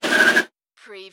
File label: Car or motorcycle brake, tyre screech